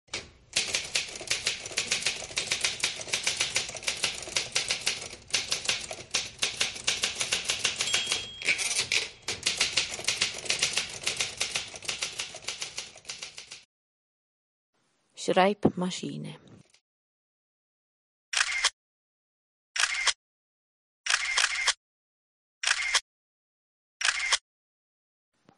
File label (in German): geraeusche